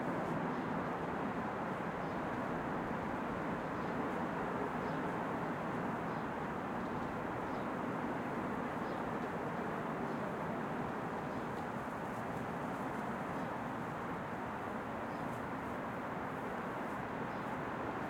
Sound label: Roosvelt Island water air birds distant traffic; Federica Vairani